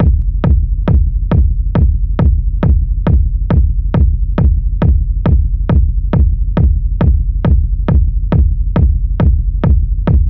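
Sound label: kick raw techno Em 137; drum loops; fm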